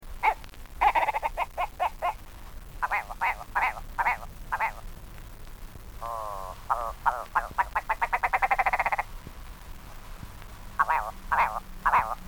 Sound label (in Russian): belaya kuropatka, birds